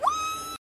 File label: Squeak; RWBY